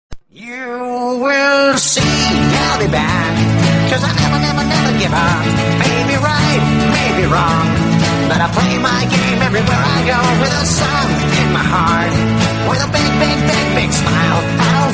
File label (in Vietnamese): nhac quang cao; sunlight